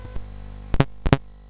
sid CSG8580R5; Ludo BASIC

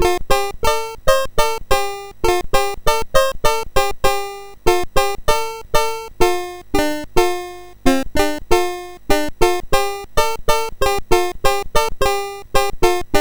BASIC, sid MOS6581R4; Yantzee

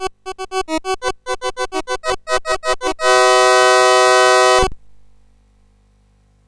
sid CSG8580R5; Ball Trap BASIC

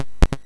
sid MOS6581R3; Ludo BASIC

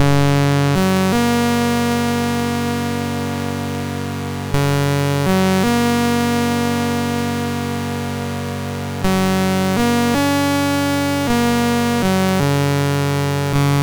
Boing, MOS6581R3

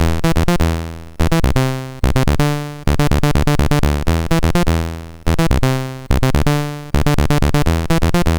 sid, MOS 6581

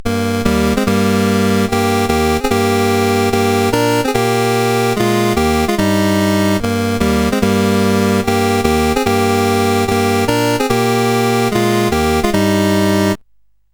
Summer Games, CSG8580R5

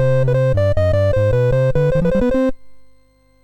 Security Alert, MOS6581R3

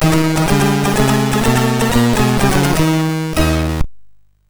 Tilt, MOS6581R3

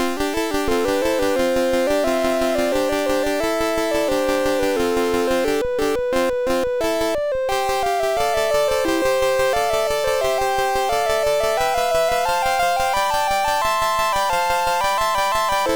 sid MOS6581R3; Galactic Muncher

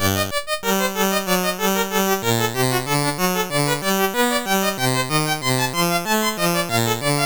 sid MOS6581R2

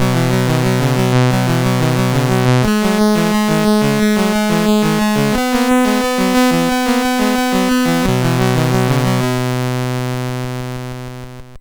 BASIC, sid MOS6581R2; Skateboard Sam

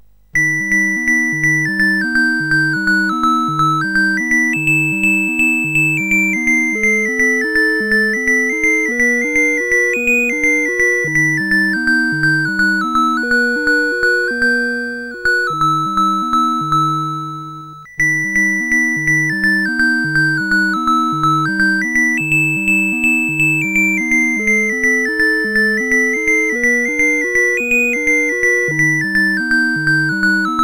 Music BASIC, CSG8580R5; Frogs

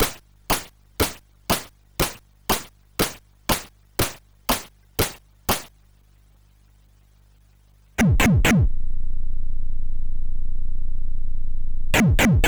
Nice Tune sid CSG8580R5

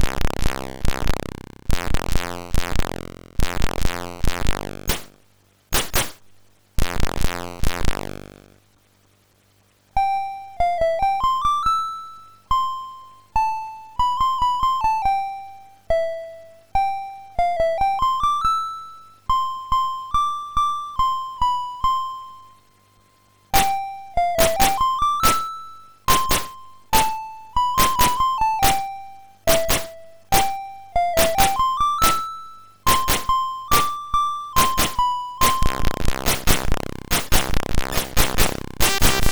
sid MOS6581R4

